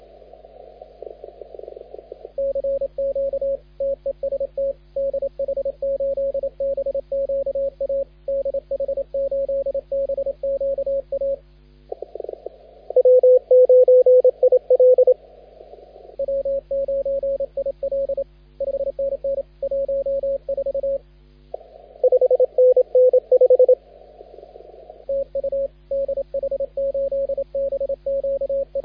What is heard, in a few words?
CW 21MHz; W9IL; CQWWCW